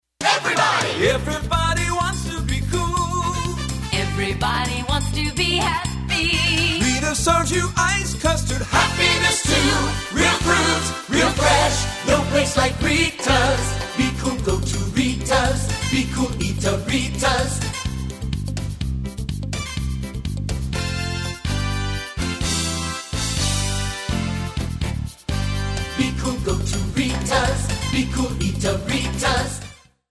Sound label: Jingle